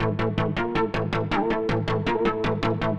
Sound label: future rave samples, 160bpm, A; Minee